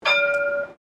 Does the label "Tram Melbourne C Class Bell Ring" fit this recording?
Yes